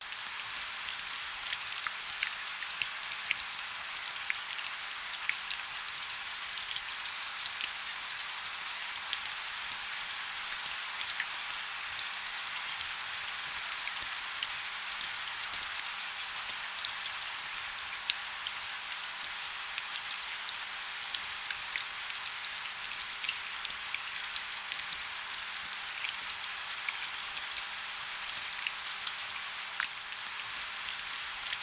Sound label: gentle rain